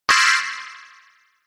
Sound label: Anime warning accent